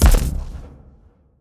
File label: footstep; smite; npc; grkconqs8 titan chaos; s8chaostitan